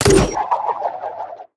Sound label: launch; brawl stars